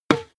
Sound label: tennis ball hit